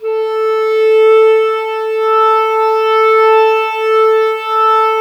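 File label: clarinet; Miroslav Vitous; Woodwind Brass Ensemble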